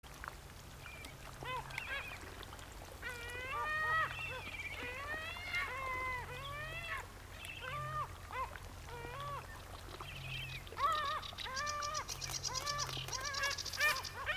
Caspian Gull Larus cachinnans

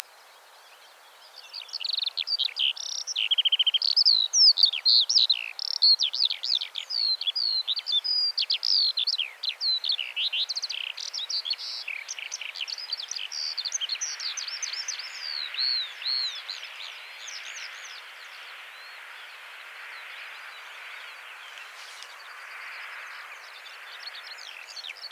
Eurasian Skylark Alauda arvensis

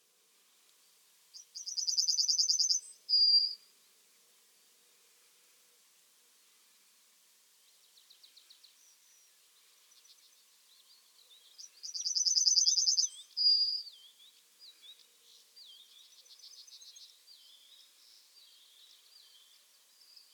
Yellowhammer Emberiza citrinella

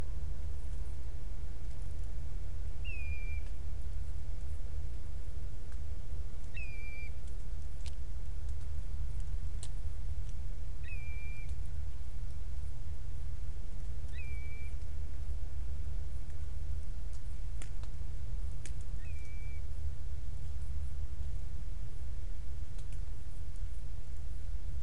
Grey Plover Pluvialis squatarola

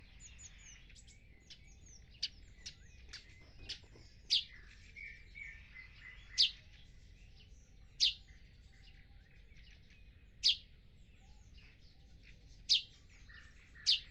Eurasian Tree Sparrow Passer montanus

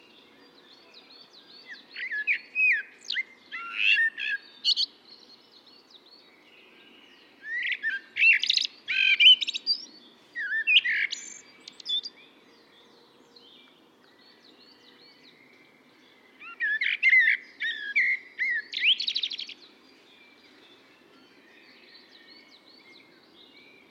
Common Blackbird Turdus merula

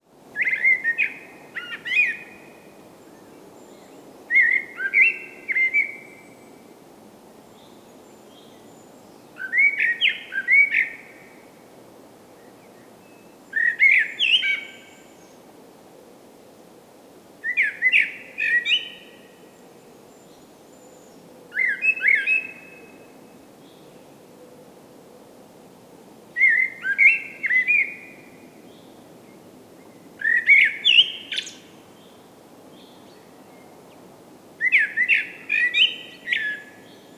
Common Blackbird Turdus merula

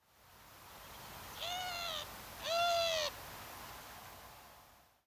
Eurasian Collared Dove Streptopelia decaocto

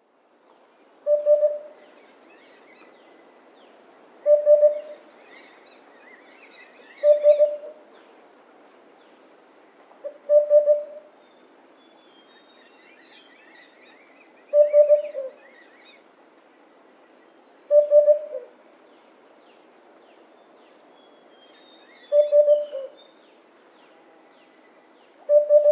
Sunda Cuckoo Cuculus lepidus lepidus

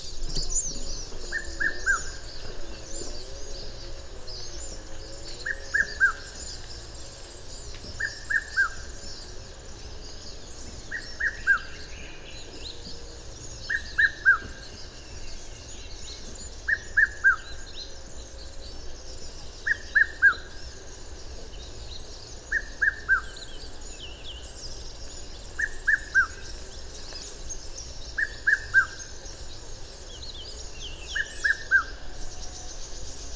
Red chested Cuckoo Cuculus solitarius

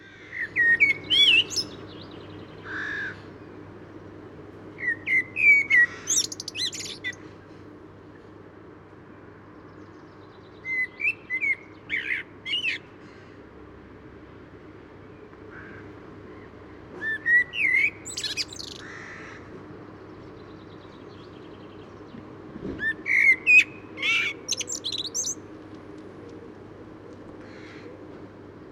Common Blackbird Turdus merula

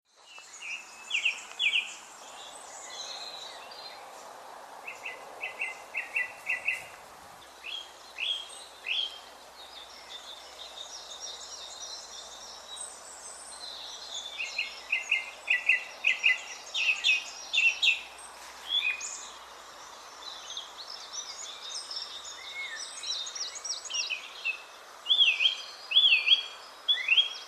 bird number three

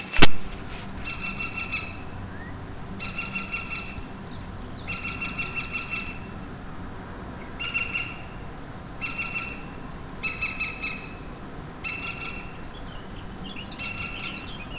European Green Woodpecker Picus viridis

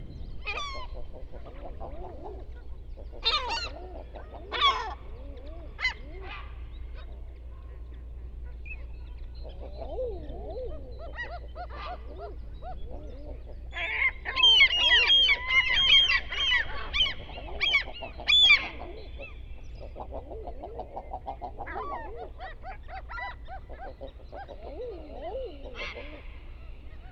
European Herring Gull Larus argentatus

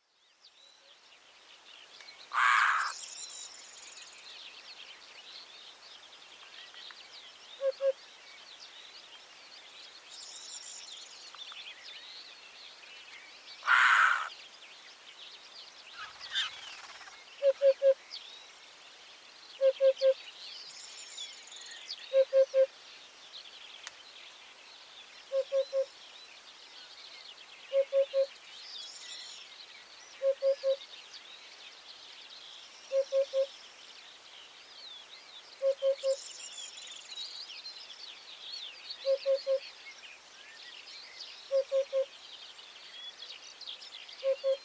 Eurasian Hoopoe Upupa epops

